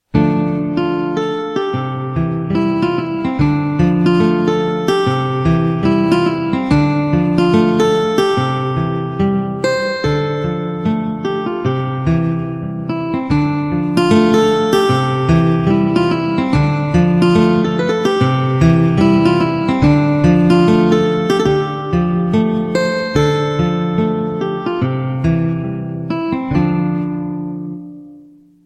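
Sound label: Romantic Guitar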